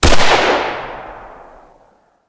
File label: mc116 shoot, weapon; metro